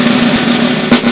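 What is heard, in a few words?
knife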